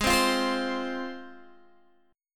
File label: Ab 6th Ab, Guitar, Open G